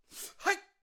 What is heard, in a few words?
Taekwondo Attack Vocals